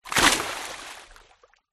nature water splash

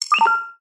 science fiction computer burst noise beep

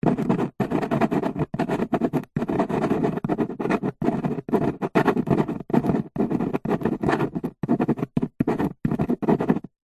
office handwriting pen write on cardboard box

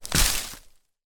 foley tree small kick; zapsplat

